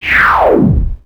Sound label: Power Down